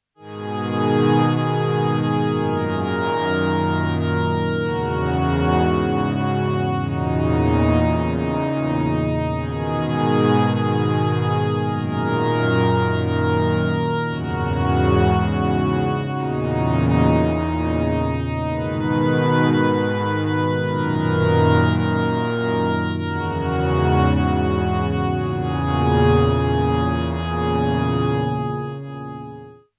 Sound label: Behringer Pro 800 Sad Strings